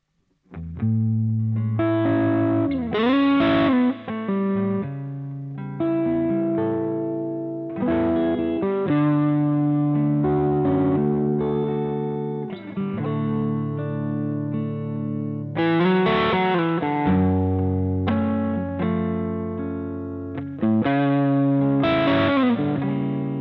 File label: Hot Rod